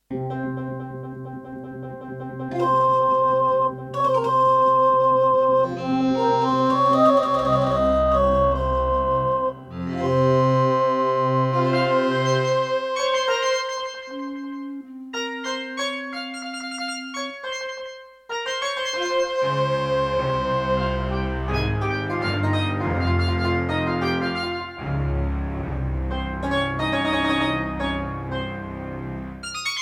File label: Korg Audio Gallery AG-10; Prince of Persia 2 - Intro